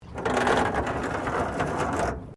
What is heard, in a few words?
Chain rusty drag metal; Chains rusted from sea air; Dragging